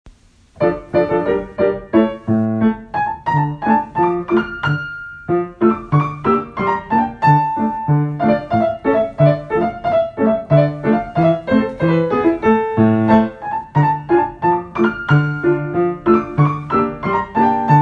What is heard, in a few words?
cz d min